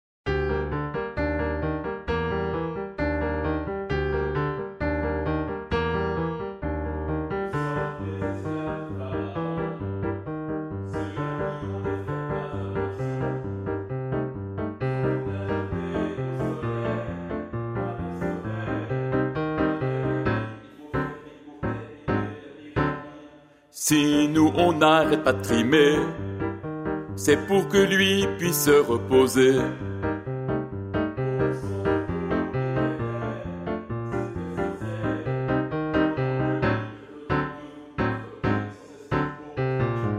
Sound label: BARYTON